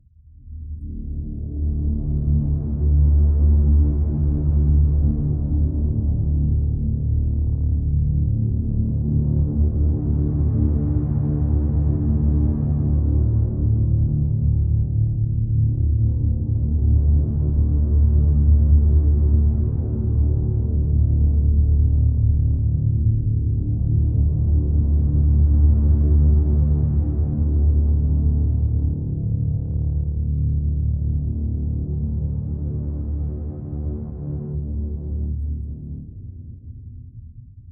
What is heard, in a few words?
ATMOS; Generdyn